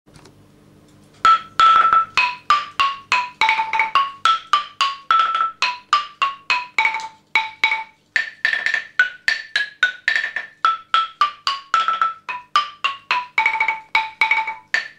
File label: xylophone